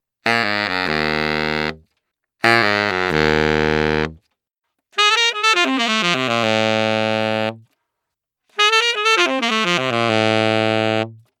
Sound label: far near M201TG